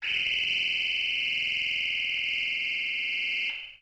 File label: Classic Ray, Electronics; Sony Pictures Sound Effects Series Volumes 1-10; Sony Creative Software